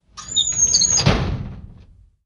Drawer Metal Close

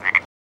Frog Croak Single

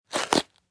Detergent open box

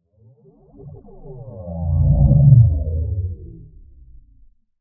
Space Ship Pass By; spaceship fx

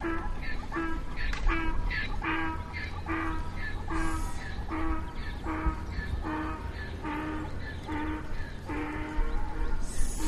Donkey; Brays, Medium To Close Perspective.; Amb Complaini

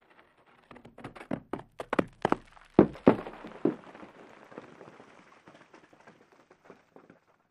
Avalanche, Single Rock Falls; Avalanche 3

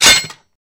Metal Impact Slide

